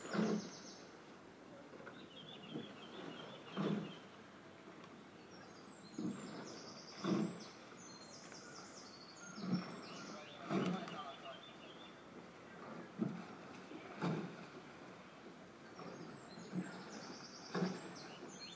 the beautiful sound of an international rowing viii